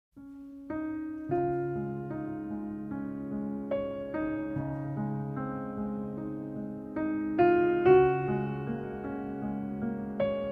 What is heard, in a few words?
Piano Version